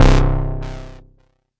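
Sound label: mbrute synth, Arturia MiniBrute; DRUM MACHINES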